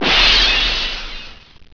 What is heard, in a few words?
glass